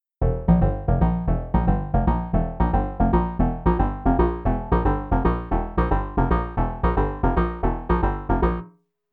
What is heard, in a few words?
Blip